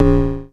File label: FREQ MOD, Dual Percussion Synthesiser; Klone